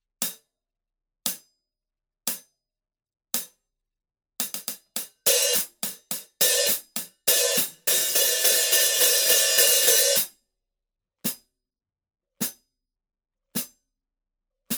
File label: Signature Power Hi Hat 14 Pattern; New Instruments 2021